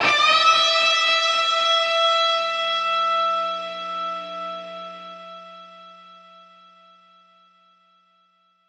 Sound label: Guitar Notes E; Zero-G - Future Wave